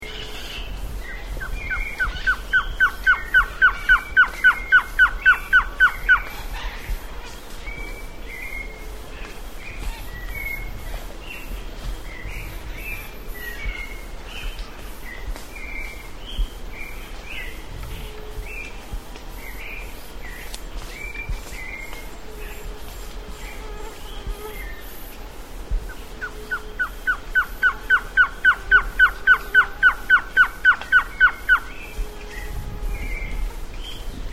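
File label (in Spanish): Surucuá Aurora, Trogon curucui, Blue-crowned Trogon; cantos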